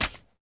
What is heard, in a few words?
squish